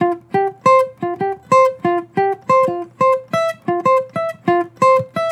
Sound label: Acoustic Melody Loop 180 BPM F Maj; Cymatics